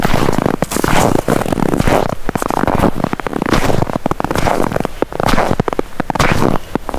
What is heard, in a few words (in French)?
pas neige